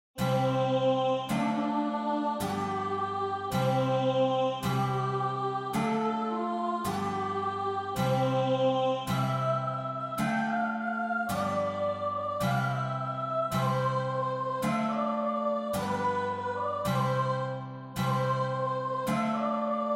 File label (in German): Alles schweiget